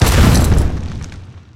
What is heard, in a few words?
roll